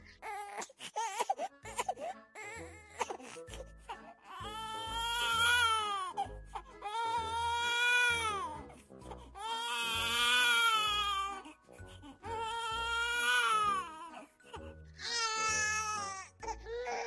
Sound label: baby crying sound; mother life simulator game